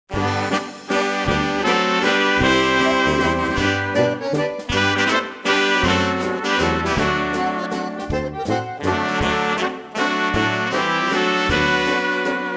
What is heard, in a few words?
Waltz